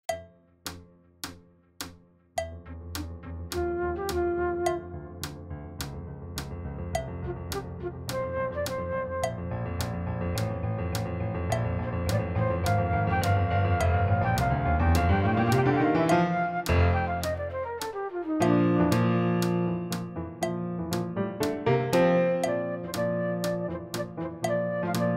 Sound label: piano flute fast; Uebungs-CDs; Playbacks